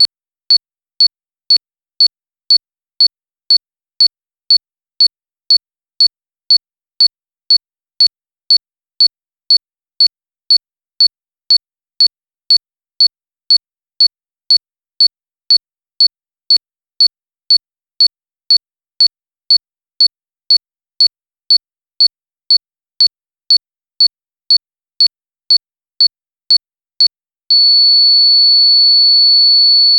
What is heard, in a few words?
bomb countdown; fps